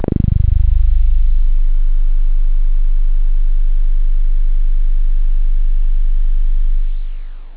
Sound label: synth bass; da capo vol1; instruments